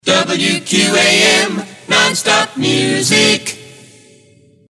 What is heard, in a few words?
Moog; WQAM; Gwinsound